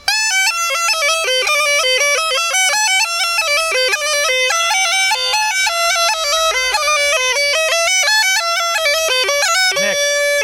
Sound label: Chetvorno Line 4, Small Gajda